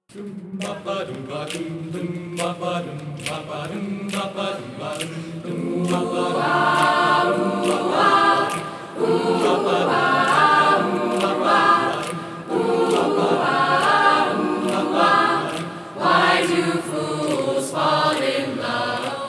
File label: Concert Choir; Spring Music Festival